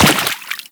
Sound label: run water, footsteps; Warframe; Teshin